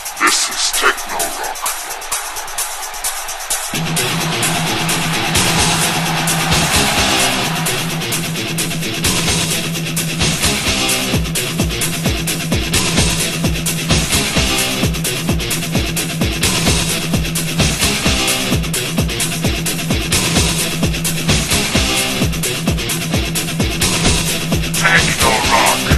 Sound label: Metaltechno